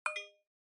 OS Sound (Speaker-Notification)

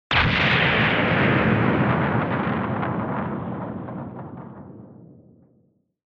Retro Anime Explosion High Short

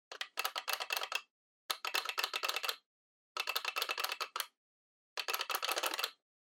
Flip Clock Time Dial Mid

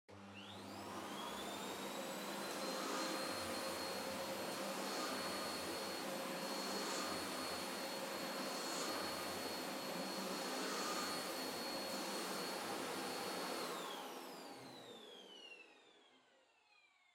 Vacuum Cleaner Far Vacuum Floor Soft High; 掃除機; 遠い バキューム 床 ソフト 高

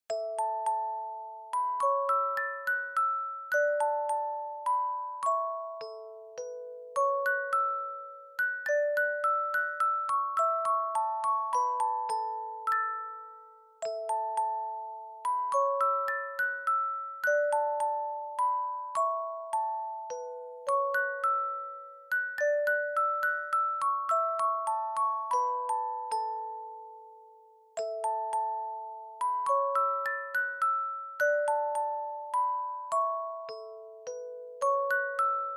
Akatonbo Dry Fast

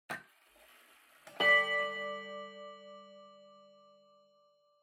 Pendulum Clock Chime Short

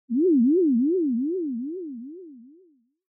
Fantasize Short Mid; シーン・妄想; 短 中

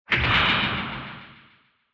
Retro Anime Accent Short

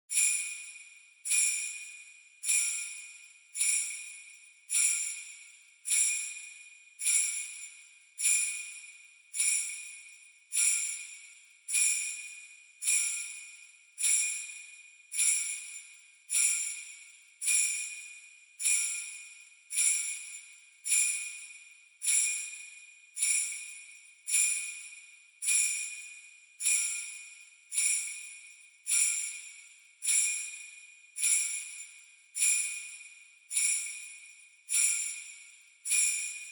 Sleigh Bells Twist Gentle

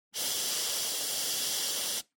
Air Duster

